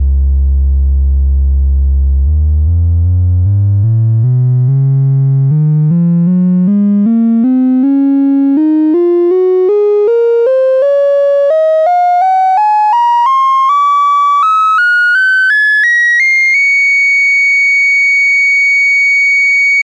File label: Sine